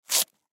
Hockey Shin Guard, Hockey Shin Guard Velcro Remove; Sports; Hockey Shin Guard Velcro Remove Fast; Blastwave